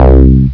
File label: Mono Bass; HyperComposer DEMO